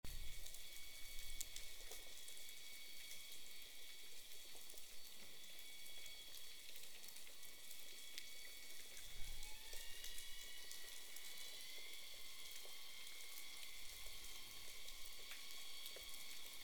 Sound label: sound of hybrid vessel electric engine; whale watching